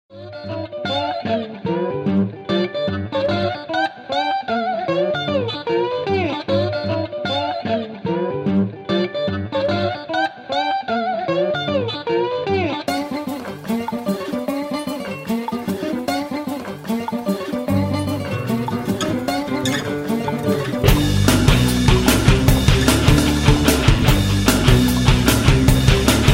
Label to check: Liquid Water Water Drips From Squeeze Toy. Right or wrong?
Wrong